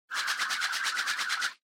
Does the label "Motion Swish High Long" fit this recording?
Yes